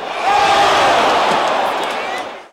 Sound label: Hockey Crowd Reaction; Crowds